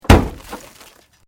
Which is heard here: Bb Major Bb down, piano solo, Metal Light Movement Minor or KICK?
Metal Light Movement Minor